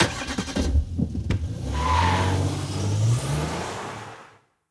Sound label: Vehicle Start; blue archive